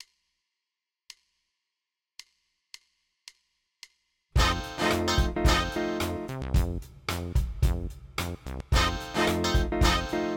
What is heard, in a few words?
Eb warmup, tenorhorn; warmups